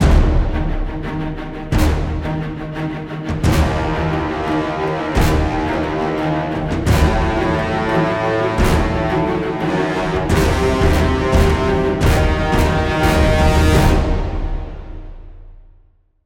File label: Combat Very High Threat Intro Long; Fortnite